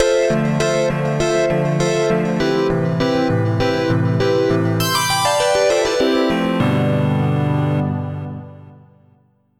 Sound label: Key Happy Key